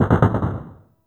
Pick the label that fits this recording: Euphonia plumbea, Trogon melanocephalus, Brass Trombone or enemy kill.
enemy kill